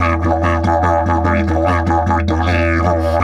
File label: 150, DIDGE RIFFS